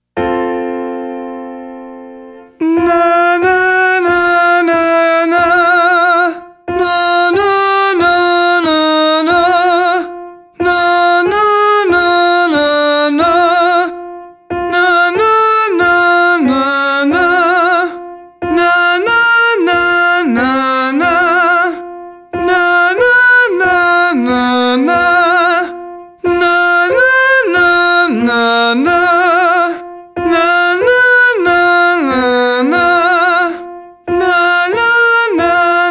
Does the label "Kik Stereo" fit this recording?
No